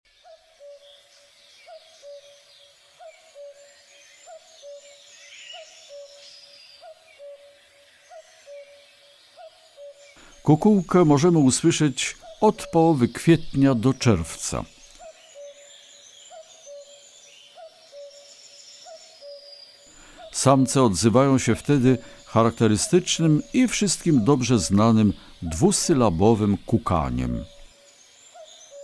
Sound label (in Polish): Kukułka; Kurs PTTK; Przyroda; Tajemnice puszczy